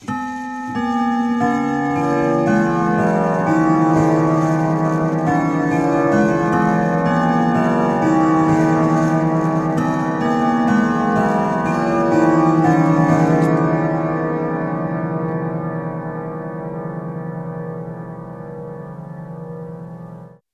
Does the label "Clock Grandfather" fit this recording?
Yes